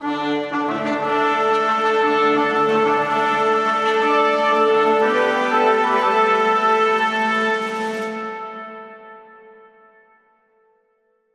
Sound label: Fanfare